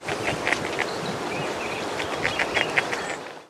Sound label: muskrat